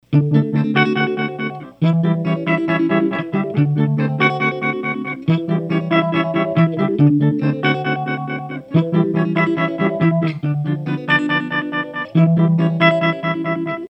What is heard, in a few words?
Tap Four